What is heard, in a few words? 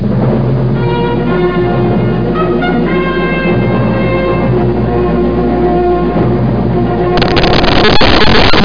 theme; dogwar